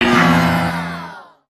friendly fire; aronwk collection; ndaudio

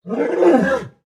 Pets Lion Beg; creatures pets; Lego

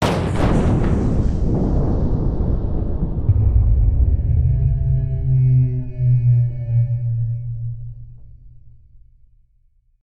camshake; spaceship

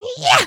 excited, emotes

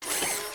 Overbuild Fidget; emotes

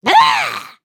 Air Fall; Lego; Strombie; creatures organic

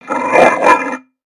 Pet Dog Robotic Angry; creatures pets; Lego

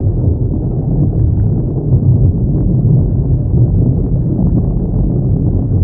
Maelstrom Ocean; ambience

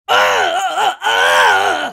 minifig shocking death

